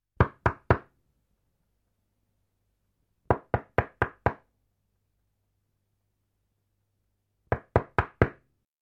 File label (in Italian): BUSSARE ALLA PORTA